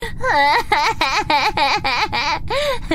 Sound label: uehehehehe